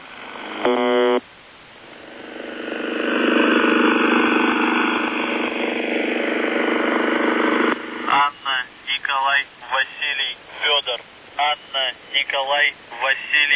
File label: buzzer usb msg